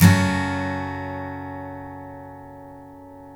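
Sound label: STL F# Minor DN, Guitars steel chords; Spectrasonics; Hans Zimmer Guitars VOL-1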